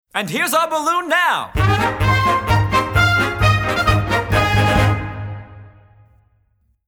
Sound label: Balloon Descent, VOCAL TRACKS; MUSIC; Wizard of Oz